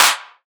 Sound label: Clap, Techno; Techno Sounds 2024